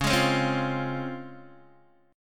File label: C sharp Major 7th C sharp Guitar DADGAD